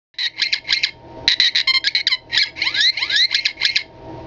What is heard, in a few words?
r2d2 fx sound; POTF2